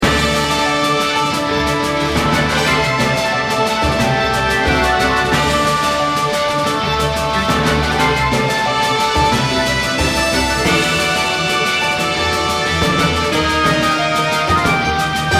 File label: Victory Loop; RPG Maker MV